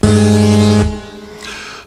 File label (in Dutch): Duits Start Horn; Kermis Horns